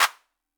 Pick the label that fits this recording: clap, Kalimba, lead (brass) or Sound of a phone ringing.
clap